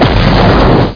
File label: Fighter Explosion; Missions of the Reliant!